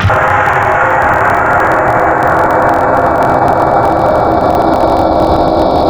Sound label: Noise Crash; Ableton Live 12 Lite; Vector; Sprites